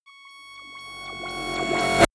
rise buzz high

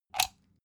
socket pull plug hard

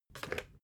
water boiler pick up

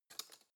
cutlery spoon drawer grab

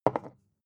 bottle put down tipping

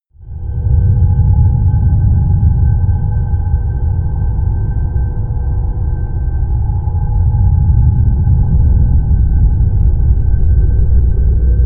drone airy rumble

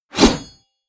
blade whoosh medium

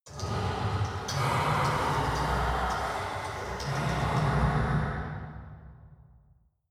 mechanic click

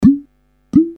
bottle corks pull

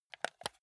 console controller home press slow

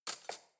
cutlery spoon drawer place

